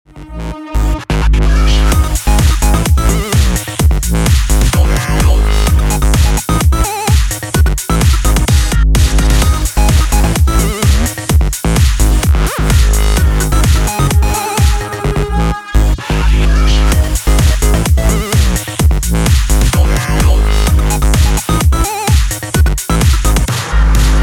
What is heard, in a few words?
disco megablaster